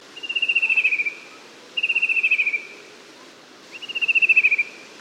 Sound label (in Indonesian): suara wiwik kipas